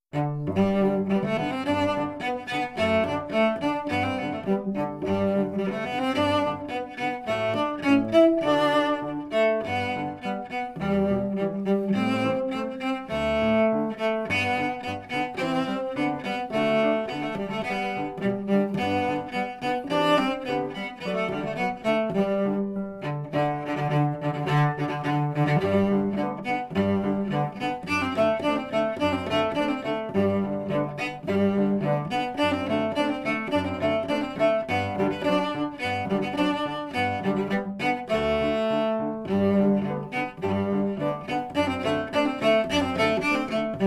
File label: Hunters Chorus